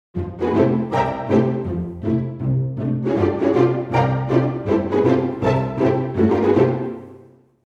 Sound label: Ensemble, pizz; Cinesamples